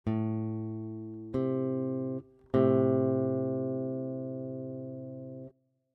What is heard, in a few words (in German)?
Intervalle Kleine Terz